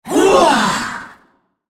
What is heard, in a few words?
spellcast effort; FizzSkin04